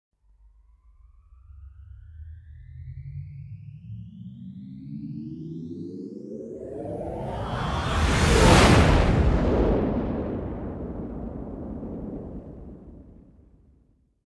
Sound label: Star Trek Enterprise B Warp Pass By; SOUND EFFECT Star Trek